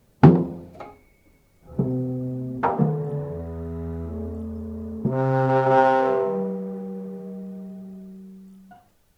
slow clumsy tuba music, out of tune

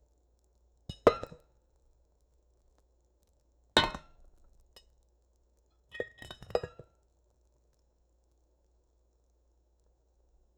backyard ambience with, boiling

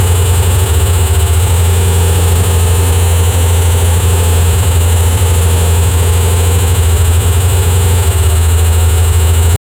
cyberpunk racing car engi, engines

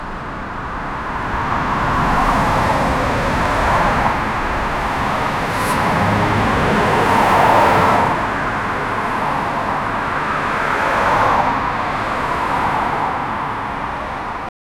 choppy fast forward sound

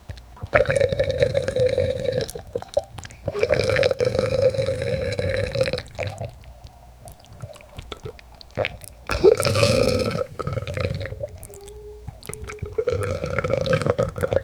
gulping a water hose with, close up

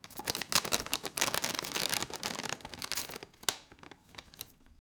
pay sale cash, money

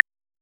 soft addictive tactile po

